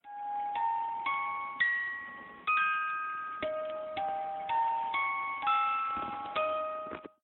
jewelry box

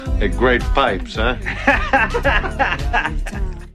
great pipes

